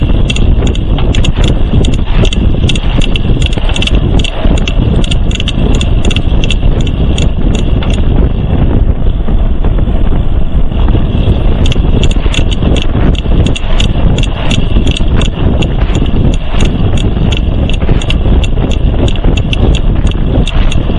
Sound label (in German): geraeusche cane creek thudbuster lt, fahrrad